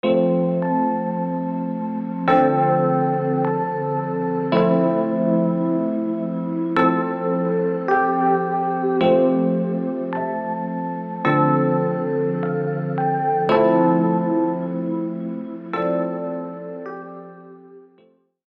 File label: F 107 BPM